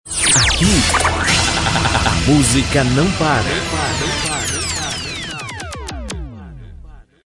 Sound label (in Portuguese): vinheta